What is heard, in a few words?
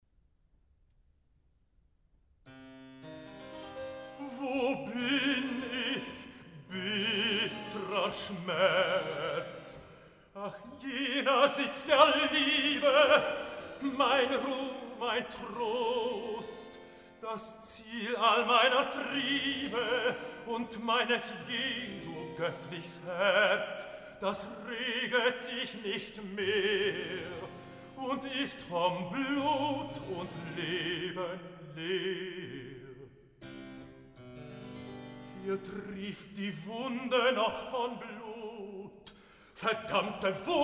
Recitativo; Mozart; Oratorios, Cantatas and Masonic Music; Grabmusik KV42-35a